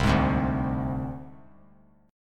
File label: C Suspended 2nd Sharp 5th, Csus2#5 chord; strummed; JGuitar